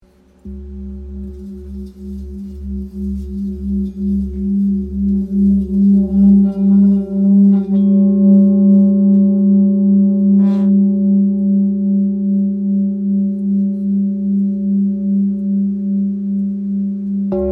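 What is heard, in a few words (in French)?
Bol ramgandi do diese, bol chantant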